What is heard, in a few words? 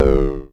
TOM, Klone Dual-Percussion-Synthesiser; KLONE FX NW8